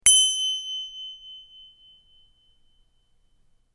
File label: Little Bell, ringtones